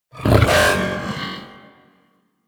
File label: Jump Growl; Enemies; Vocals; Warframe; The New War; Archon; Amar